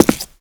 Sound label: foot stone; footsteps